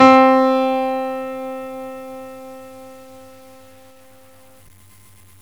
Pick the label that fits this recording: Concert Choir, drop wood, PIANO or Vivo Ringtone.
PIANO